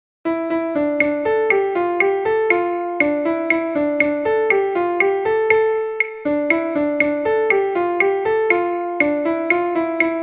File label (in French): Mazurka rythme; partitions stage 2025